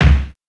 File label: Kick, Fairlight CMI III